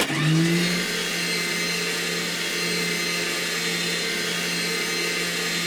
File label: Aircrafts F-86 Canopy; DCS